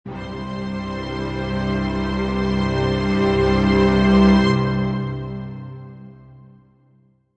Och Stng Int C, Orchestral strings intro loop C Major 110 bpm.